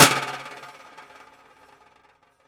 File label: Prepared Drums; Sonic Foundry (Sony Creative Software); The Electronic Music Manuscript Collection; Battery 3 Sample Kits